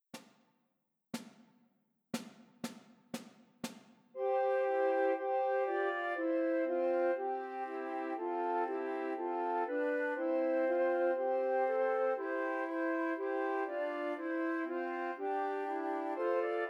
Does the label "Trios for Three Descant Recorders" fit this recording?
Yes